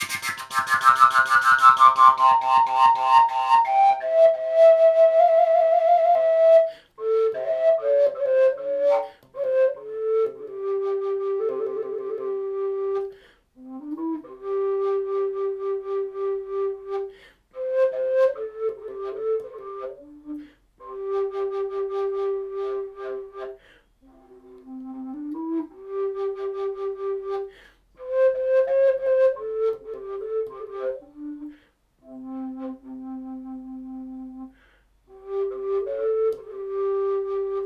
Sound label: Fujara C